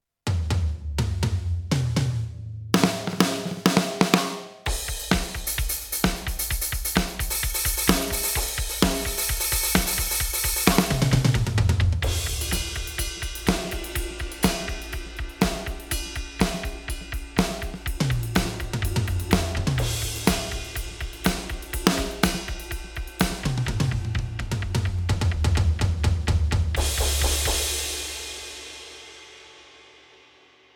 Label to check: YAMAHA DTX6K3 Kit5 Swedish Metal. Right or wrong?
Right